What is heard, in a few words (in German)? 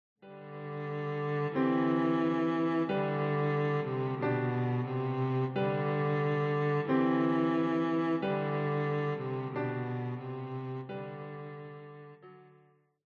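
O du froehliche, Violoncello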